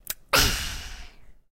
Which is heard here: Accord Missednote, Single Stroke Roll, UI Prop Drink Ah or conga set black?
UI Prop Drink Ah